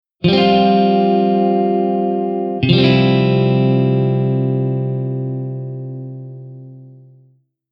Campo Maior Acordes F2 F27M

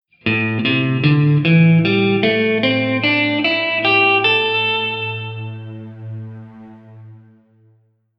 Escala Pentatonica de Am7

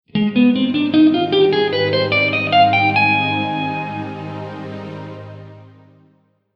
Escala de Ab Lidio 9

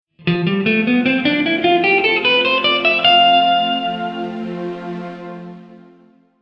Escala de F Lidio

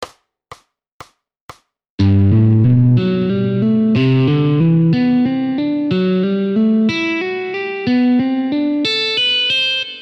salto de cordas 120BPM; guitar

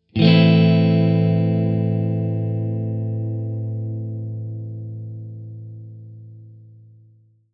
Acorde de Triade de Am

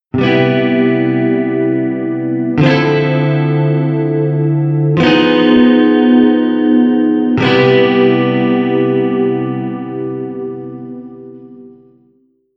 Cadencia C7M Dm79 Fm7b5 C7M

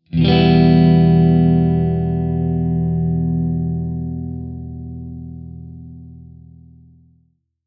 Acorde de Triade de Em

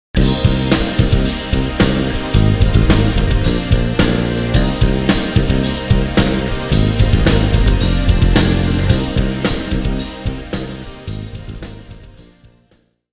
Riff 1 com falta de medios

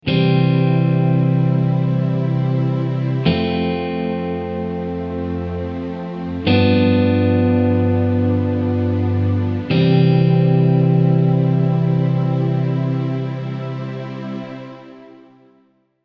Progressao C F G7 C, guitar

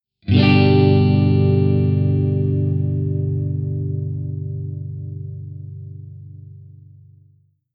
Acorde de Triade de G

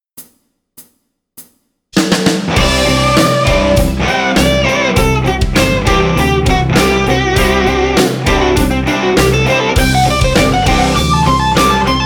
Guitarra Penta D7; Dicas de Guitarra

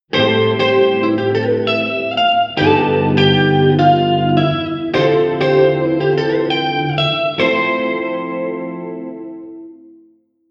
Cadencia Am7 G7b13 Dm79 F7M

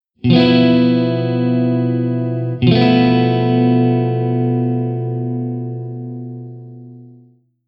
Campo Maior Acordes B4b5 B74b5

